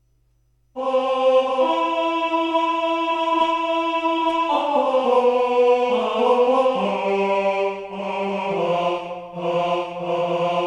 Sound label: tenor; Va pensiero